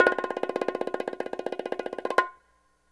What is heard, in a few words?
HI BG ROLL, LATIN PC; Best Service Advanced Media Trax Modern Composer